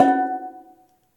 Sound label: Steel Drum Gb5